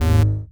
8bit

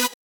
synth

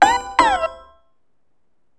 SE RESULT LOSE; maimai

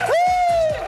Foooooo!!!; ドナルド

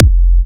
Kick

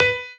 piano melo

